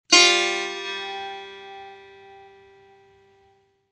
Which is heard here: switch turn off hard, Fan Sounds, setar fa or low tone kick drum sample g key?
setar fa